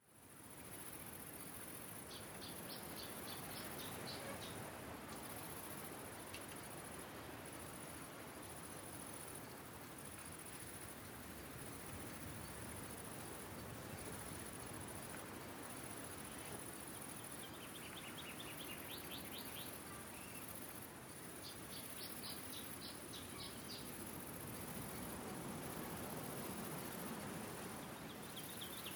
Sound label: Ambience; Age of Empires III; cinematics